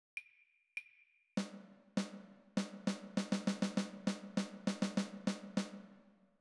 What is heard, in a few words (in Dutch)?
luisterdictee voorbeeld